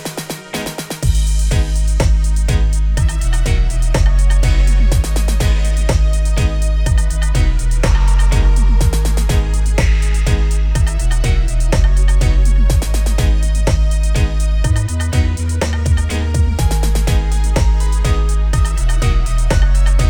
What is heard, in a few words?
dub